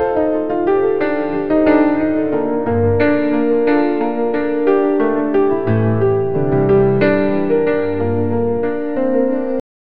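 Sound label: clean corporate background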